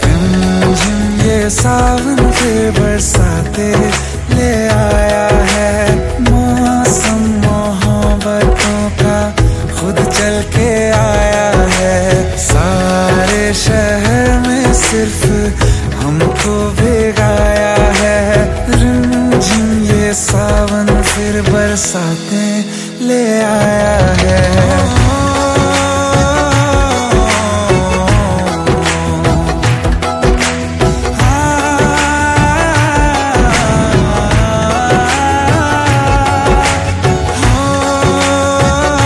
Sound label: Song Ringtone